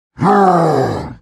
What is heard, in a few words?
Mob attack